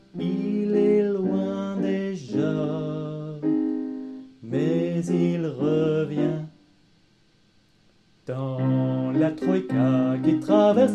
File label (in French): danse russe fin